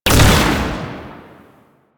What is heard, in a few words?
Archgun Shot; Warframe; Archwing